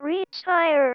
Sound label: Female vocals and robo-vox